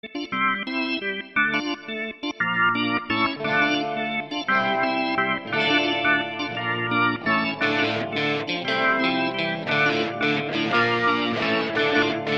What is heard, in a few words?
Guitar